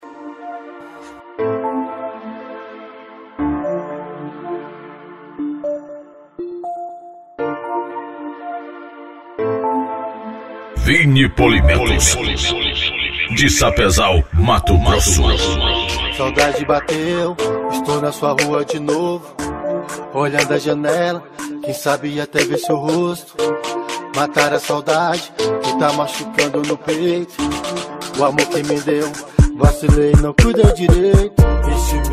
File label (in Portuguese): funk e rap